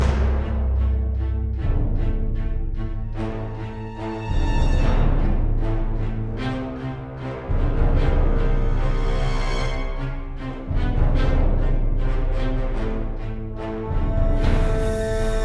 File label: Boss Fight Stem; Ferno